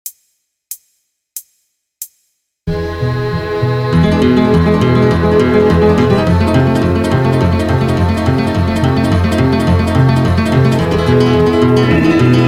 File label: backing track